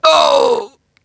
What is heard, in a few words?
enemy die; Wild West Shootout (Arcade) (gamerip) (2019)